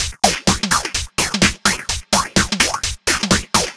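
Funk No bass drum, Drum Loops 127bpm, Nu Dance Electro; Zero-G; Soundclash Nu Dance Electro; Acidized Wavs; Jumble Funk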